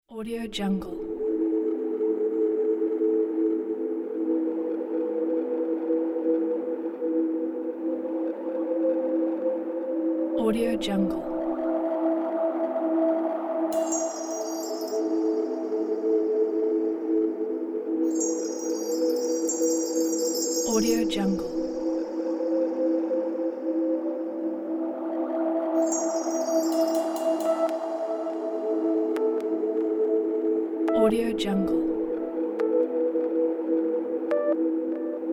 midnight rain; blueberrybeats

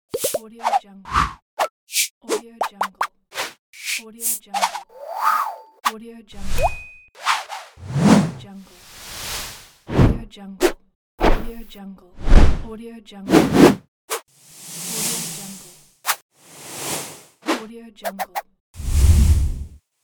swooshes

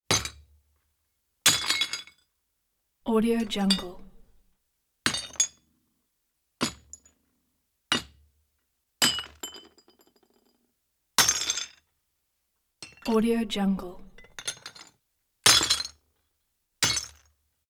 glass smash metal object hits glass bowl

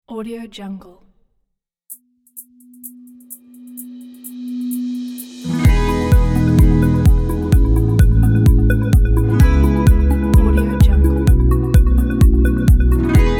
travel tech

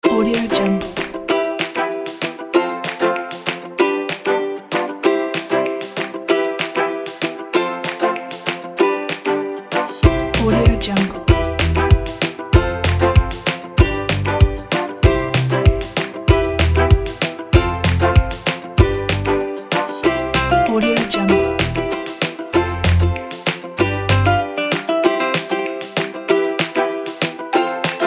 happy feeling

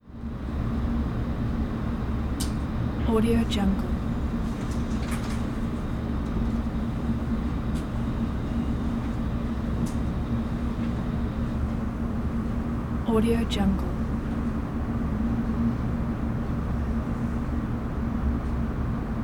new york city airy interior 25th floor

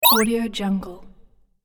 arcade game single laser zip

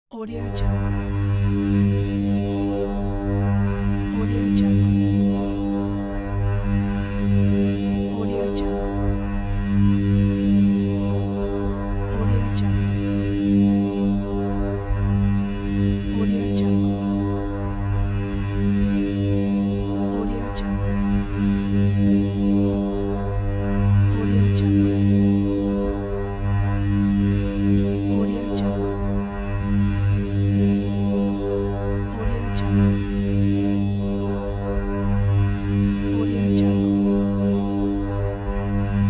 magnetic field

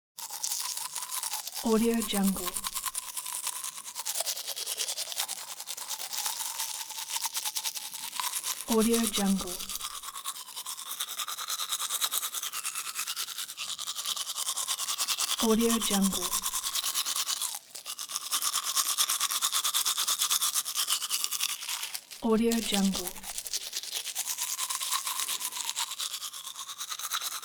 teeth brushing

